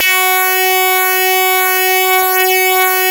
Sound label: MS20, LEADS; Masterbits; Vintage Voltage